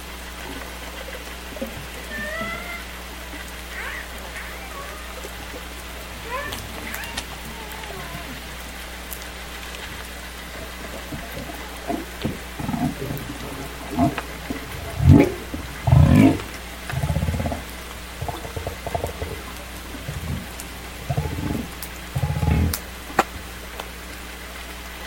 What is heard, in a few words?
humpbacks; biophony; inland WA BC